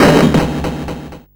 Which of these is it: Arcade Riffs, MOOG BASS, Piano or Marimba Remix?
Arcade Riffs